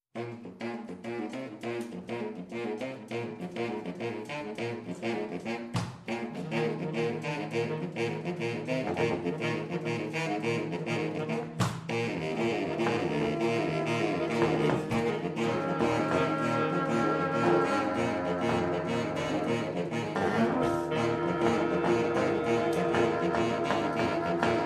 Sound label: SAX MAFIA